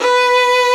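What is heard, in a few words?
Vio legato B3; Digital Sound Factory Virtuoso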